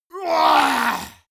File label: monster growl attack throaty; schreie